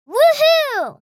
Woo hoo cheering girl child kid

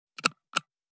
Camping flashlight turning on and off

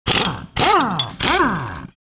Impact wrench on quick bursts

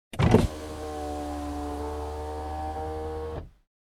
Car interior electric window down

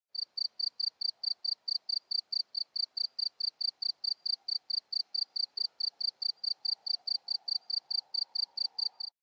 Cricket chirping individual

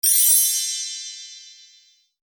Fairy magic touch

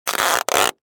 Scotch tape pulling a piece of strip

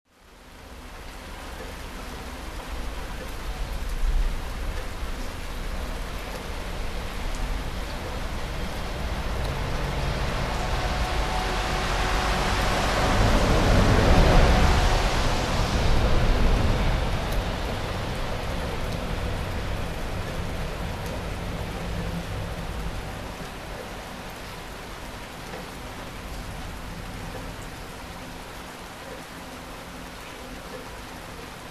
Large suburban town center ambience rainy day

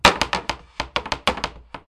Wooden shelf multiple small impacts

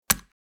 Metal miniature game board map single move

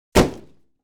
Simple wood hit impact

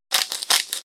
Salt grinder twist crunch; cooking

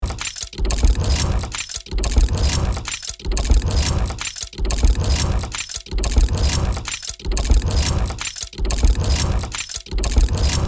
Steampunk machinery automated loop

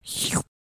Slurping drink

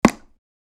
Dartboard impact dart hit; sport

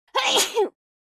Fast explosive female sneeze

